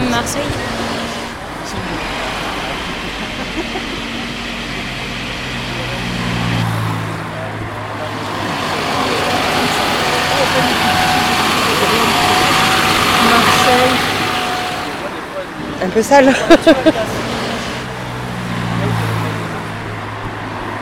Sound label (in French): ambiance bas sale musique; je marche donc je crée